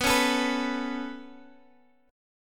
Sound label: B 7th Suspended 2nd; B7sus2; strummed